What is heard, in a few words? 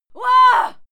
female fight scream hua; schreie; menschen